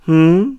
Hmm; Yuga: "Hmm?" (noticing Link); Zelda

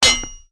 Sword Ding; Link's sword hitting a wall; The Wind Waker

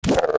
Jump; Lizalfos; zelda; OOT

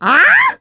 Shout; The Lady shouting ("Ahhck!")

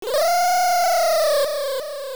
Wind Fish Cry Clear; The Wind Fish crying (noise-free version)

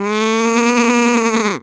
Hoot; Kargaroc; Zelda

